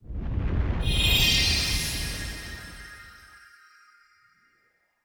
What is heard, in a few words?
s rank up